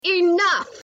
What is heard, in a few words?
spray paint